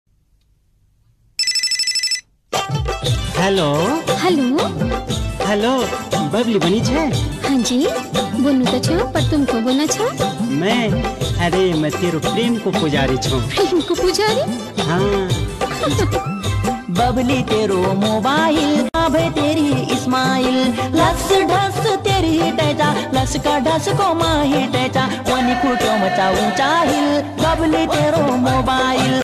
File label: Garhwali song, ringtone